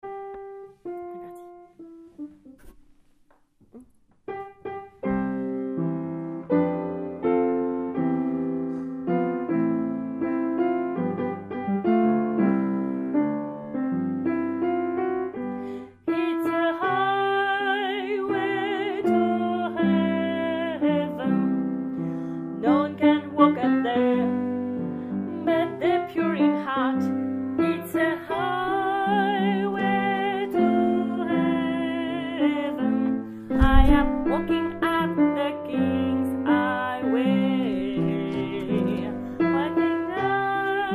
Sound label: alto